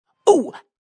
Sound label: Vocal Funk Ooo, Funk vocal loop sample.; 110bpm